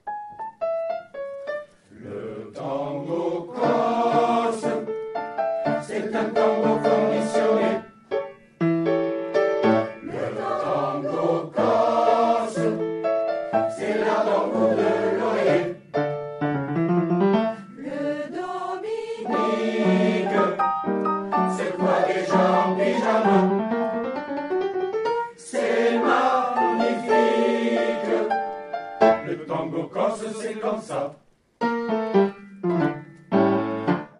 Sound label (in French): tango corse